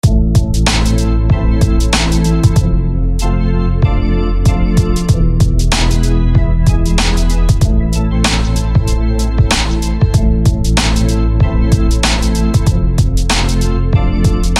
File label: Deep Pipes